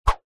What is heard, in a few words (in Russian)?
zvuki metallicheskogo trosa (kabelia)